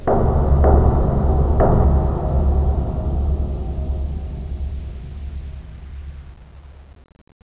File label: metal; cstrike